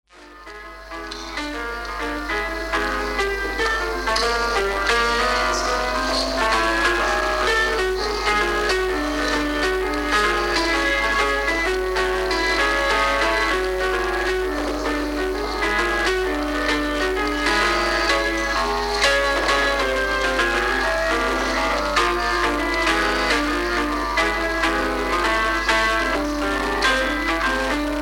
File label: piano music